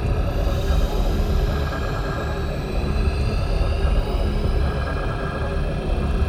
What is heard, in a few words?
toxic god windup; Stellaris; toxoids